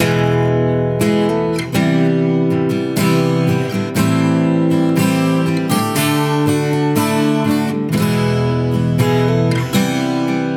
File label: guitar